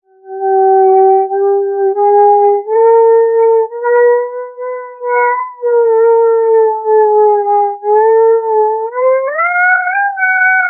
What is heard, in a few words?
tyrannotitan roars